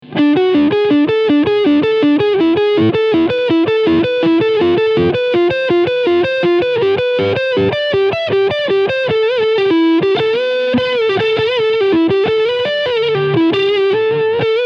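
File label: PRS SE Silver Sky Overdrive Front Pickup; Dragon Fruit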